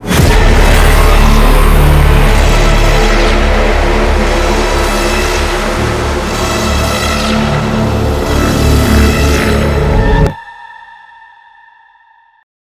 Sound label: Countdown; Korps; Modern Combat Versus